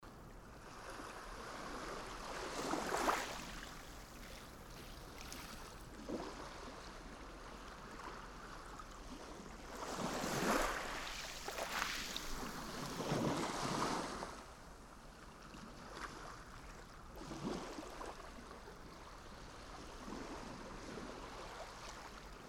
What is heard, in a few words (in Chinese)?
沙滩 beach, 白噪音 white noise; Xiaomi MIUI 12.5 China; MIUI12.5系统音效全收录